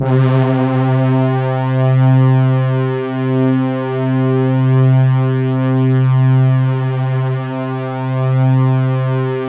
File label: C3, Synth Line; 90 s Sample CDs; NiceBeats; SynthLine VOL-26